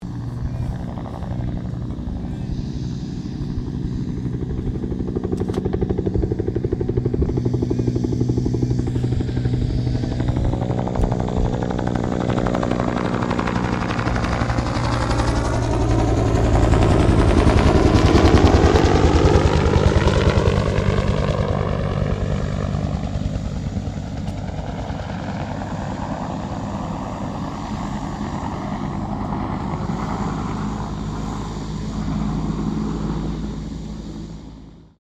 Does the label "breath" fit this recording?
No